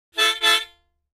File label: Mouth Organ Shrt, harmonica; woodwinds